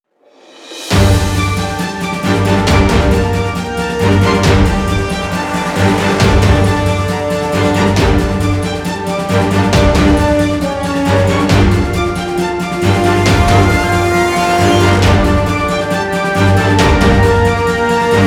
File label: tension violin; gerilim; By Burak Sound Bank; tension vol 18